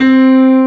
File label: piano; Analogon